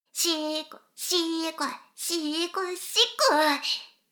しこしこ音声; 少佐殿; 牧場戦腺

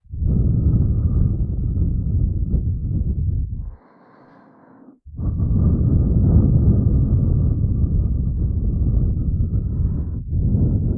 両耳ふー5 分, ASMR

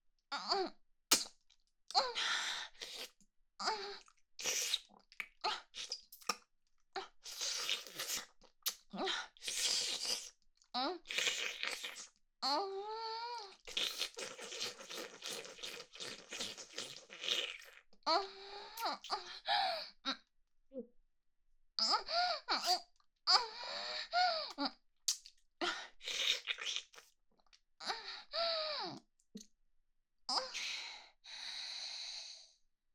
フェラ・精液を飲んでいる声; 明るい少女系カノジョ

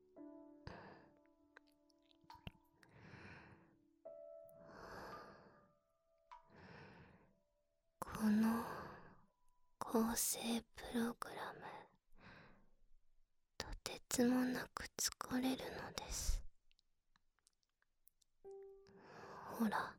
添い寝, ASMR

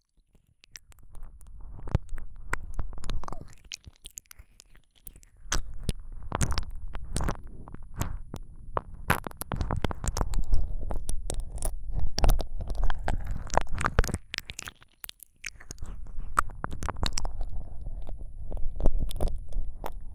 じっくり耳舐め, ASMR

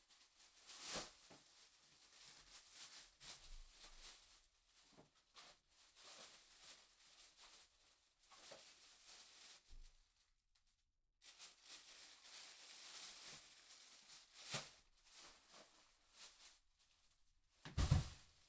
買い物袋を持つ→置く; KU100; 食事に関する音

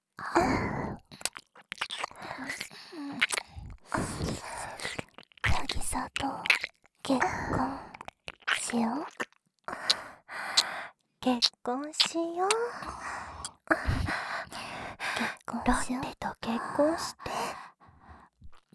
耳舐め; シャルロッテ

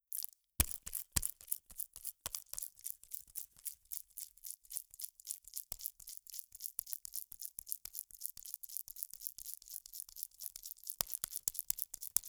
ぱんぱん

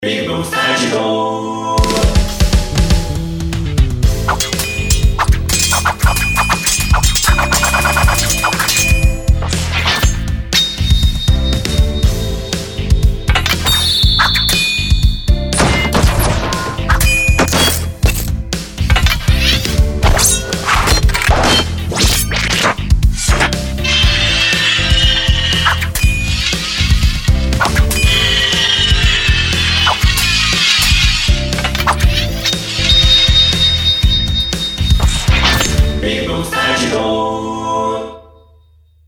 剣 Sword; ぴぐみょん効果音素材集; ぴぐみょんスタジオ

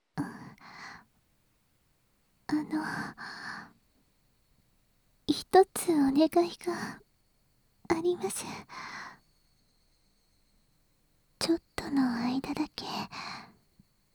耳舐め 反転

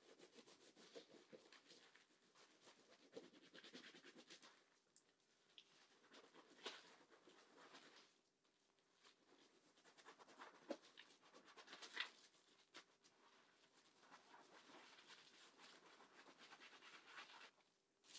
石鹸を泡立たせる

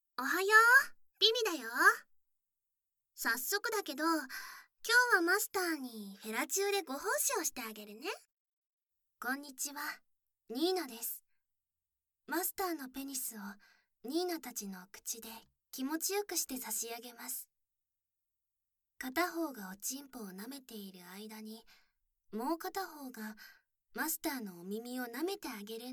耳舐めとフェラ; 奴隷美少女に、ベッドの中で耳舐めとフェラをされる♪

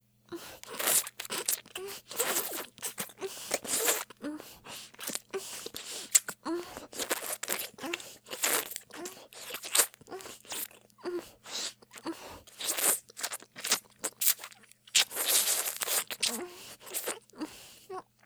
激しめに フェラ, ボーイッシュ系 音声素材; Honey Drop

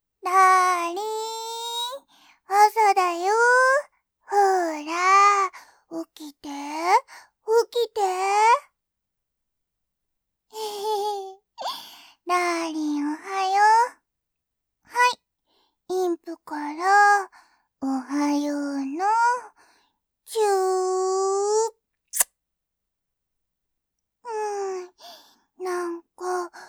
おはようのキッス, ASMR

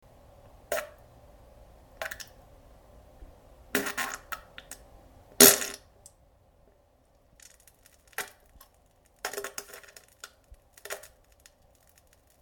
げりよりなんべん ぶりゅぶるり音

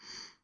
ブレスショート

